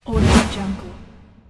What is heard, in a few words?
whoosh